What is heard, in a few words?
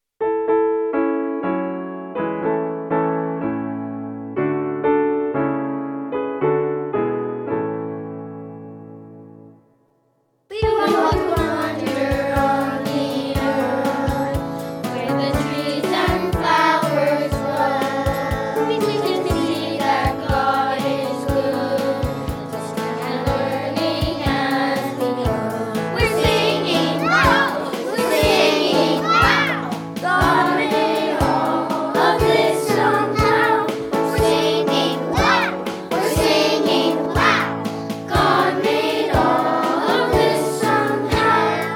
We're Singing Woah